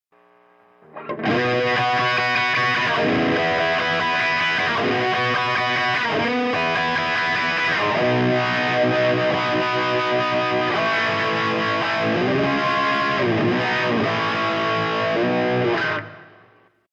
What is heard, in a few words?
riff wah